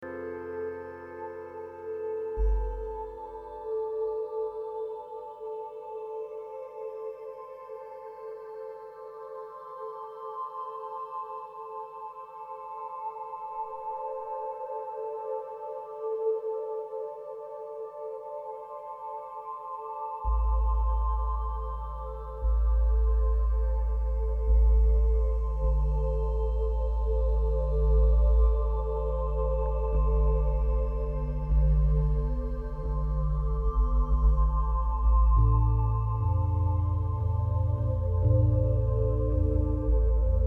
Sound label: sci fi sounds